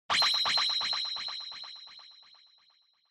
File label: Flash Low Long; Onoma